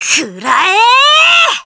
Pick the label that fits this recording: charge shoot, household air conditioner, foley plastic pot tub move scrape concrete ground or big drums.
charge shoot